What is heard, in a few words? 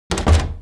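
wood close, door; Mafie 1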